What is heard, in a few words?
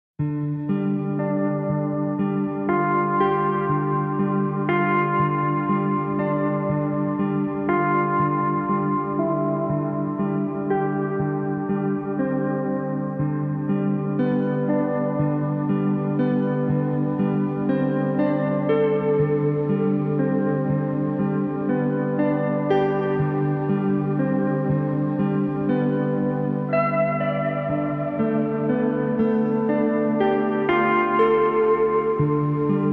Guitar